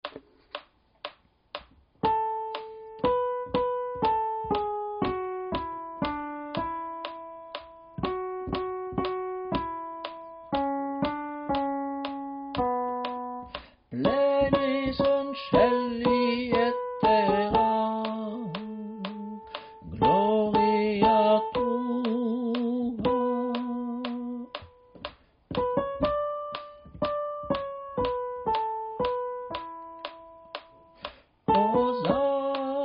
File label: tenor